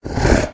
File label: monster; raycaster